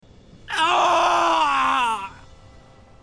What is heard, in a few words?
death scream; gothic 3